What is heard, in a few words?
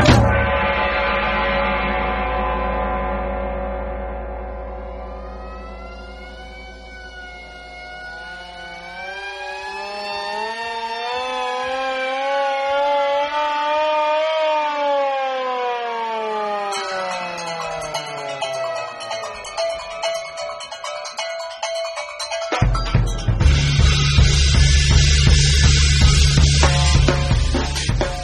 donkey beat